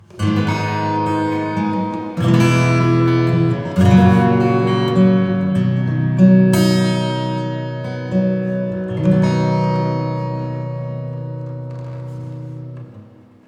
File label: acoustic Spring; Reverberate 3